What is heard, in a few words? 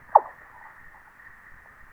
noise reduction, recordings under ice